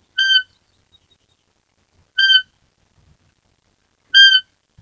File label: Roe Contact; Nordik